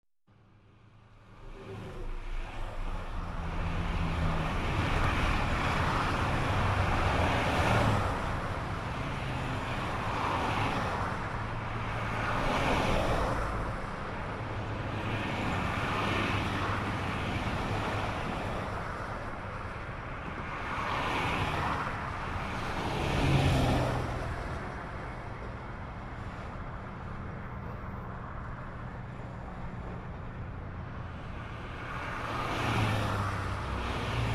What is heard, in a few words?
Cars passing by on freeway